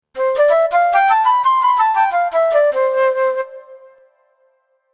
Flute Scales, Flute playing an ascending higher scale of C; Orchestral; Musical